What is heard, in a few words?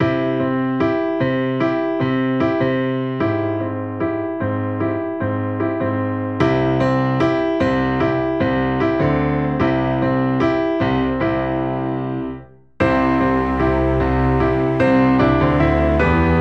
Romantic Night